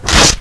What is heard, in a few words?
Tear